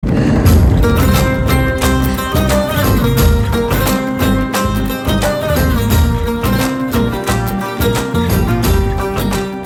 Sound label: Climax Bgm Ringtone; Ugram